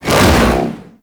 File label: Hoverboard Ground Spin C; Vehicles; Warframe; Venus Hoverboard